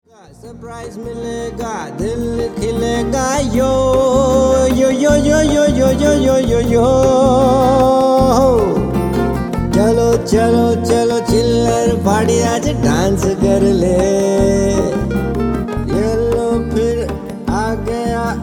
HAPPY BIRTHDAY TUNE DAD TO CHILDREN HINDI MALE

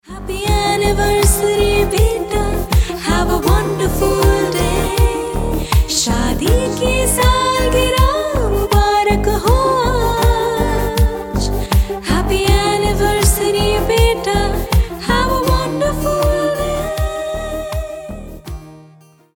ANNIVERSARY TUNE PARENTS TO CHILDREN HINDI FEMALE

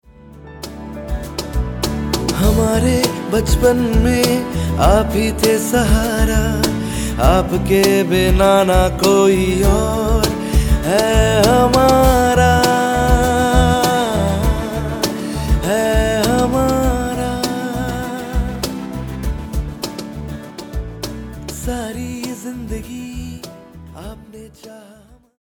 THANK YOU HINDI MALE KIDS TO PARENTS

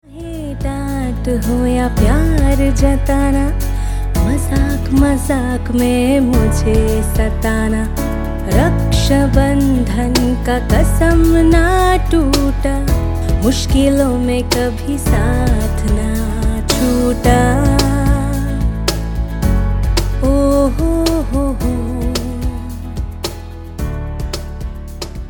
female Hindi

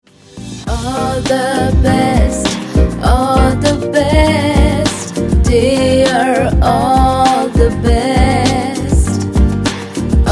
BEST WISHES TUNE FEMALE

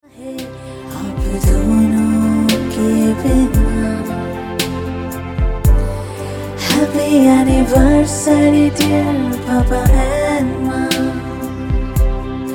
ANNIVERSARY TUNE CHILDREN TO PARENTS HINDI FEMALE

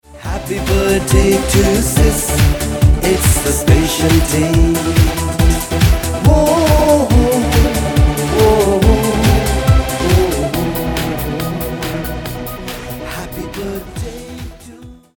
HAPPY BIRTHDAY TUNE BROTHER TO SISTER HINDI MALE

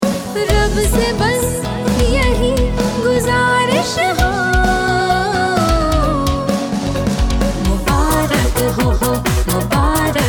CONGRATS FEMALE HINDI

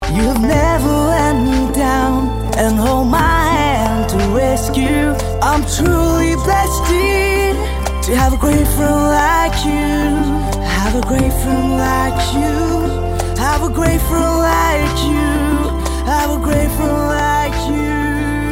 THANK YOU ENGLISH MALE SONG

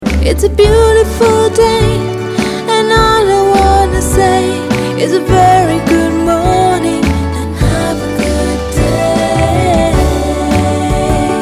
GOODMORNING ENGLISH SONG FEMALE

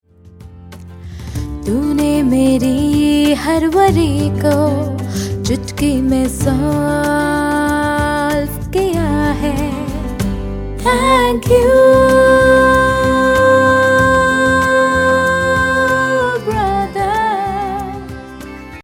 THANK YOU HINDI SISTER TO BROTHER FEMALE

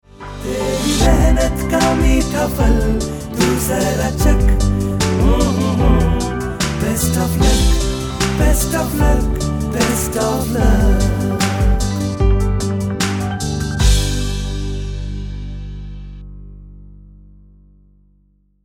BEST WISHES TUNE MALE

